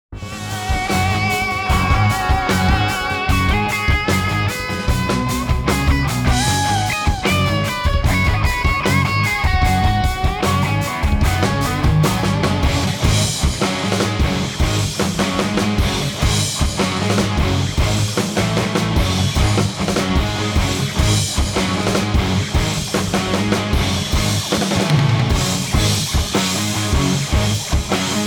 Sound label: jam in ab minor; titanic